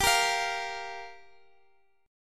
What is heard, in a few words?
G Minor 7th, Guitar, Standard; Gm7; strummed; down; capo=3